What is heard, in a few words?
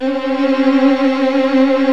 VIO MIN TRIL